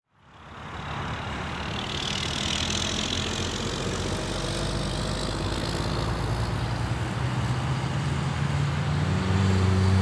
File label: Ambience Traffic Intersection; AdobeCC